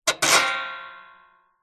industrial spring small under tension strum resonate